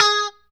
POP G#4, STRAT guitar; Spectrasonics; Hans Zimmer Guitars VOL-1; JC-STRAT POP

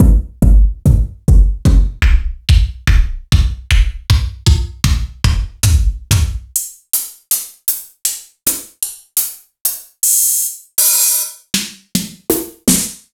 Yamaha RY-8

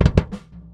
Doublekick Loop; Airwindows

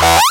Acid Sounds E, Synths; Vengeance - Essential Clubsounds Vol.2

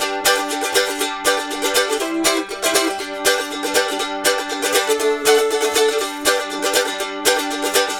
Mandolin Rhythm; Continental Drift Aftershocks

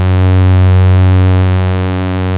PAD Geige; Best Service Gigapack 1

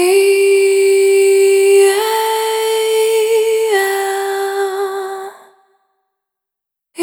Vapor, Single Voice Melodic Loops

